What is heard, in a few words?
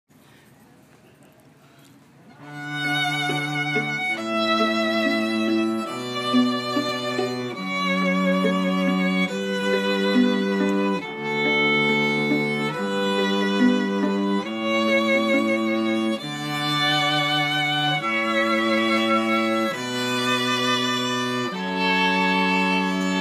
canon in d trio